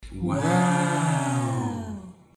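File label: people chanting wow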